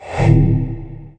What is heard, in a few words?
magic shackles; veznan